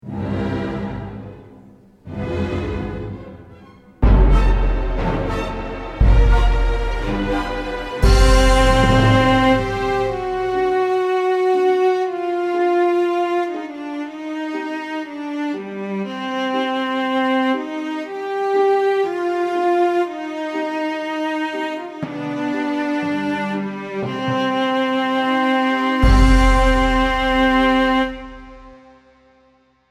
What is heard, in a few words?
Baroque cello